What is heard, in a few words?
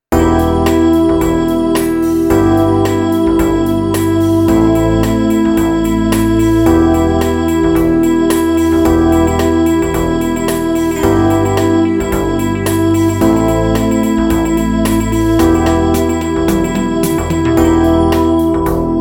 Rock Ballad Synth Crystal, in Em, 110 bpm